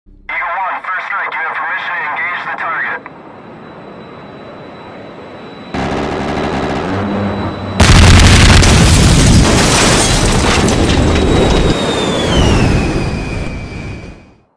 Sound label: airstrike